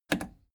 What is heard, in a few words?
plastic button scratch click enable fast